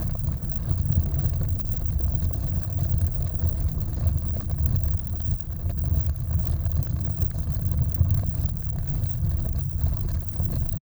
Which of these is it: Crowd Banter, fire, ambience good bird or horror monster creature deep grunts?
fire